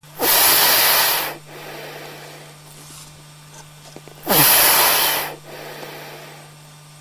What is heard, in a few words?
seal breath